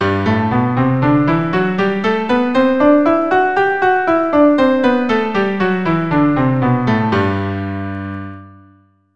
G Major 100, keyboard

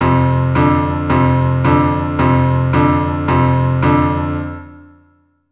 Blues Bass F, keyboard

